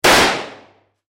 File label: warfare gunshot range, Handgun Gunshot; Machine Guns; McKinney Sound